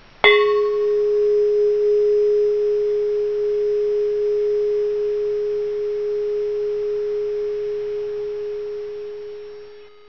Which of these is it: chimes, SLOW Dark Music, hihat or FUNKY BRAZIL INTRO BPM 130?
chimes